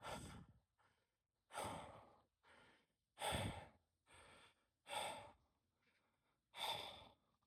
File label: Breathing Heavily